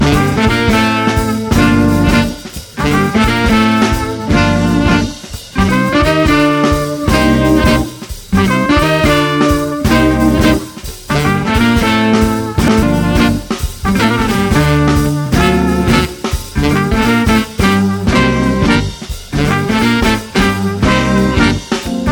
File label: funky